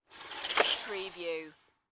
Bicycle Skid

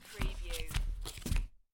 Playing Cards Dealing Three Cards Flop

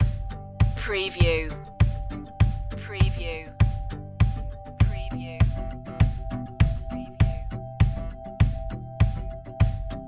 thatll do it loop

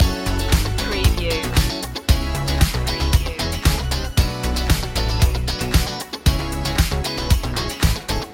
showtime loop

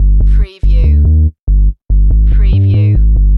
Techno-Trance Sub Bass Loop in G 142 BPM

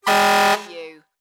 Fire Truck Horn Honk

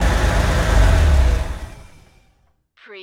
Truck Ford F150 Shut Off Engine Perspective

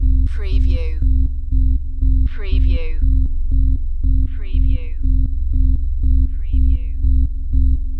Space Pulses (loop)

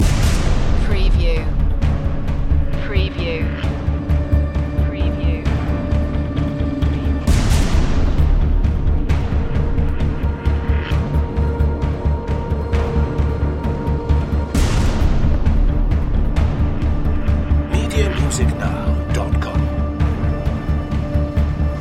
droid attack loop

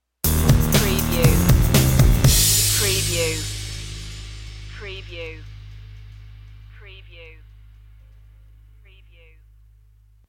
starstruck stinger

